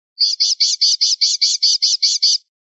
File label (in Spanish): rep rabieta achillonada, pardillo